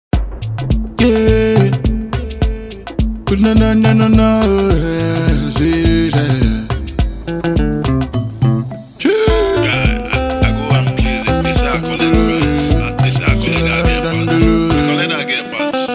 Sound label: pant instrumental